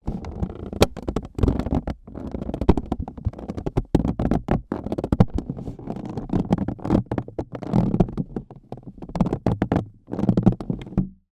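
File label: foley plastic container small pressure creaks recorded in container; zapsplat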